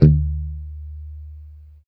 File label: E3 sus, Strat guitar; Spectrasonics; Hans Zimmer Guitars VOL-1; JC-STRAT MTE